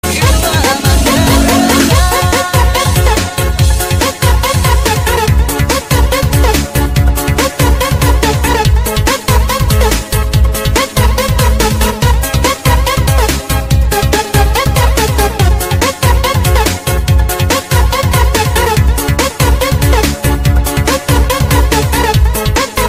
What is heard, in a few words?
breakbeat